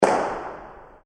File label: Massive Firecracker Bang; Fireworks; Backgrounds; Sound Effects:Backgrounds; CraftedSignals